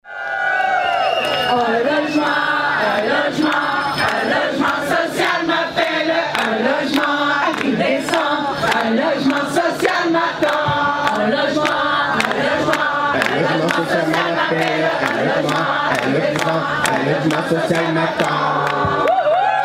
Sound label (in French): chant un logement social m'attend